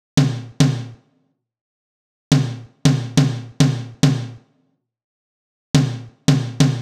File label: drums tom fills 140bpm; Touch Loops; Tokyo Electro - Mini pack